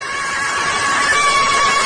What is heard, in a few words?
aaaaaaaaaaaaaaaaaaaa